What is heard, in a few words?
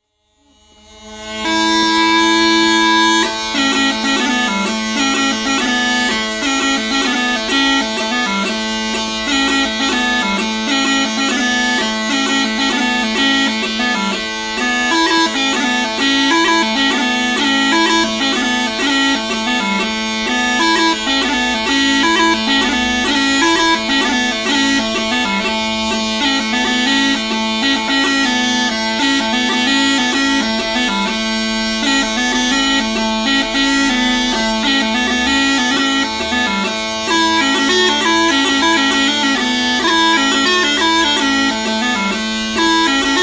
on Scottish small pipes; Bagpipes